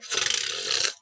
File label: roped